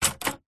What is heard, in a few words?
office typewriter single button key press typebar strike